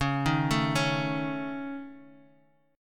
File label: A Major Flat 5th Db